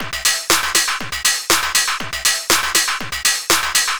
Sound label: Combo Hat